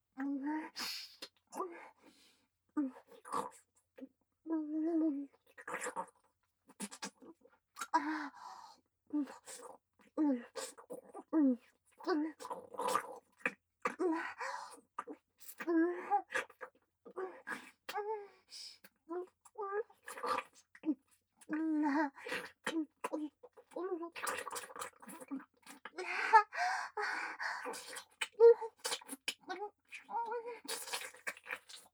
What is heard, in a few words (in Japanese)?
アナル舐め